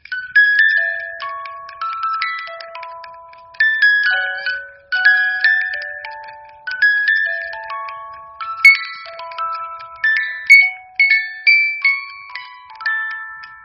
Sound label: Music Box; جعبه موزیکال باز شونده هندلی با طرح کاش